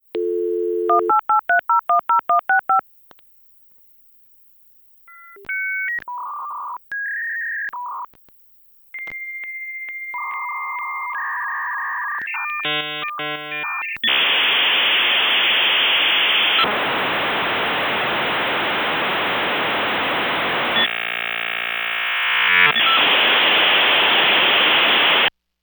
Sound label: dial-up modem